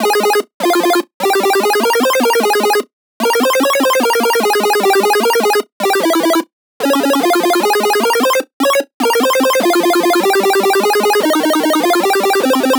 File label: Lead Loop F, 150bpm; Dubstep; Kirby; Ghosthack; Dubstep Sound Arsenal